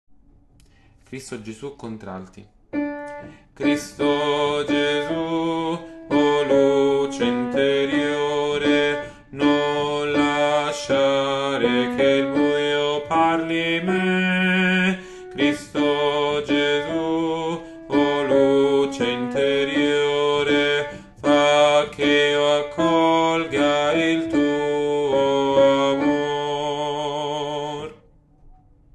Contralti

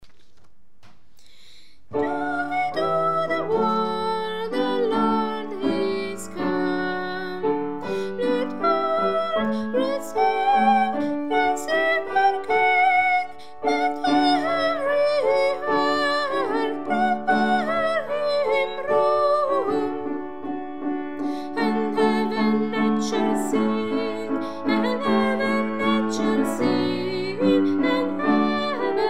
joy tenori

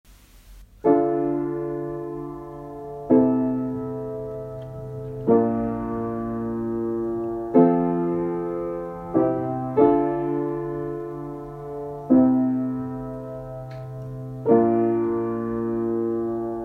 contralti; shema

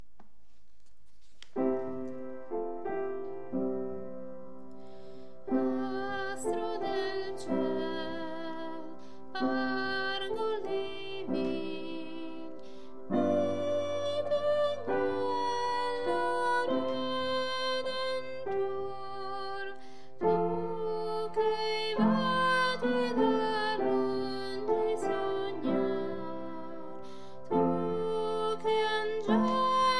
astro tradizionale soprani

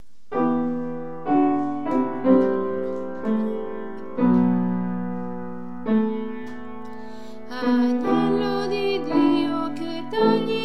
soprani; agnello breve

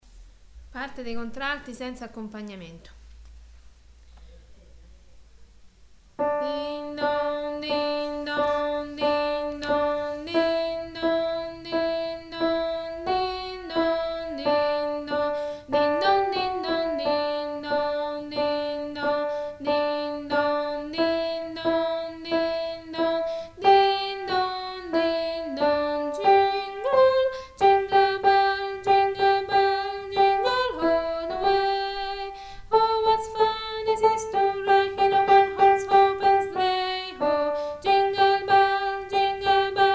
jingle contralti

